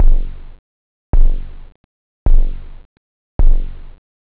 flam, drums techno; OctaMED SoundStudio V1

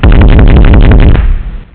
Autocannon; TacOps

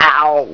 Blam; MovoD B&W

